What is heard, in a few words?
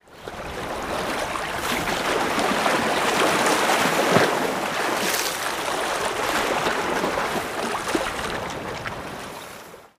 Lake and Water; Nature Elements